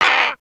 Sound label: Disapprove, creatures pets